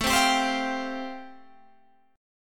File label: A-Minor 7th Sharp 5th, Guitar Standard, chord; 17,15,17,17,18,15; Am7#5 strummed; JGuitar